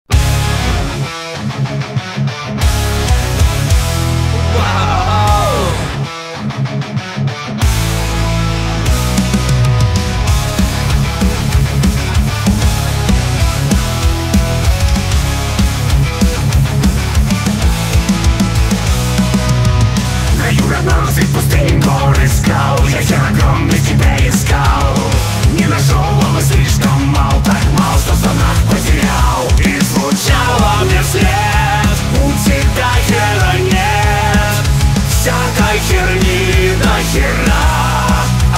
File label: metal cover